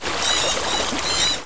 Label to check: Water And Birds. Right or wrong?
Right